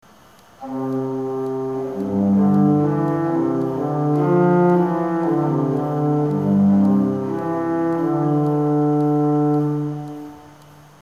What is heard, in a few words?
Gambe, orgeln; kirchenmusik kaarst